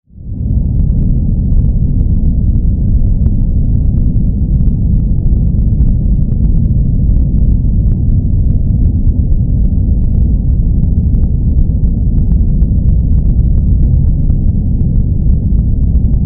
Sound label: Spaceship Exterior, Spaceship, Exterior, Mother, Ship; 5.1; BlastWave